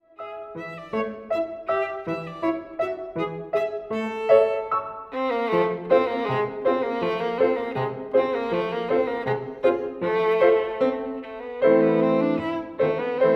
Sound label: VIOLIN; Trinity College London violin exams for 2016-2019